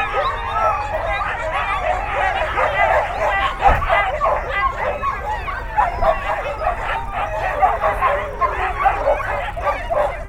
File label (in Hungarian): szánhúzó koncert, kutya; állathangok; paraszt udvar